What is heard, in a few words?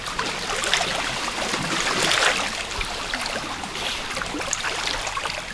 waves